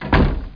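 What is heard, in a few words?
door close; tnpdemo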